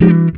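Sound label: guitar chord funk; Univers Sons; Jazzistic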